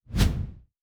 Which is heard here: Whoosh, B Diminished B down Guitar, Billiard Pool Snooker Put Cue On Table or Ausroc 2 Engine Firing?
Whoosh